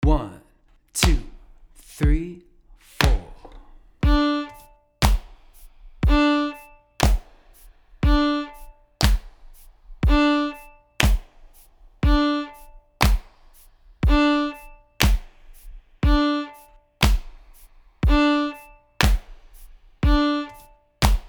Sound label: Quarter Notes Open D, fiddle; basic accent patterns